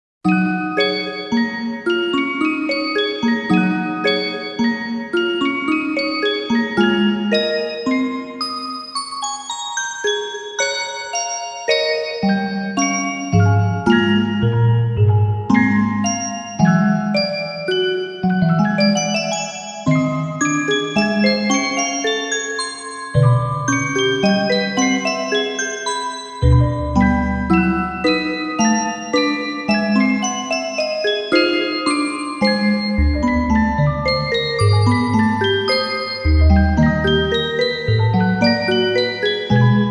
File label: Organ; Another Mind